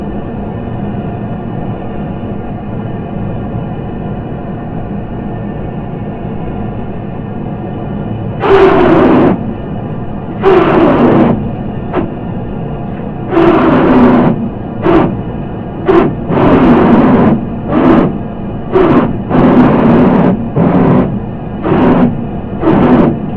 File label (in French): autoclave hydro; castanea vibrations le quiz